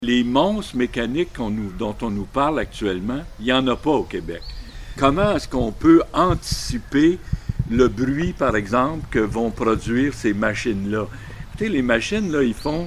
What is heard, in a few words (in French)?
BRUIT; LANGELIER